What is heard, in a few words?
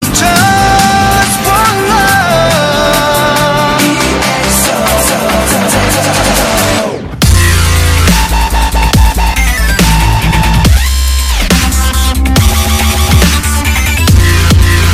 Dubstep